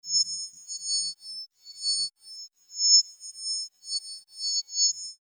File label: hi freq computer robot